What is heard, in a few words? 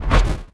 One Shot, Hits; Touch Loops; ElementsOne; Abstract Rhythms